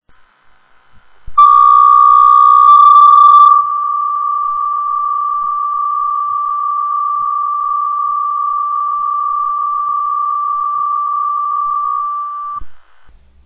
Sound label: PSK63; DIGI